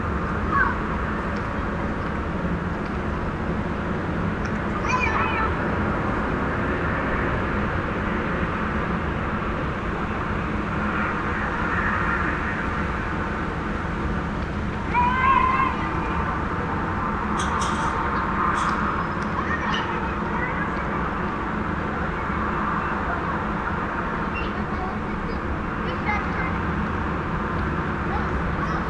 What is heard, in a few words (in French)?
Amb toit de Paris; City